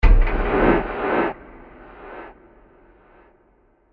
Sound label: Scary Stab With Scratches And Delays; Impacts; Blasts